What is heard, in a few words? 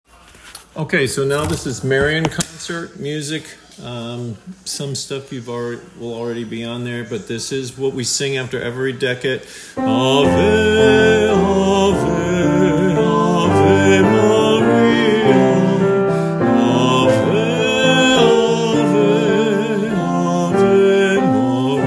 Ave Ave Ave Maria All Parts